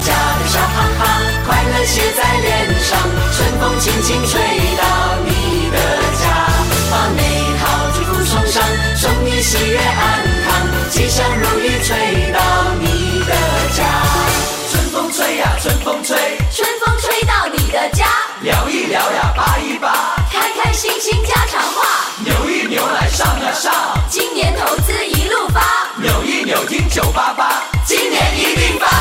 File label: chinese new year song